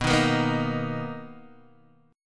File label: B Major 7th B down Guitar DADGAD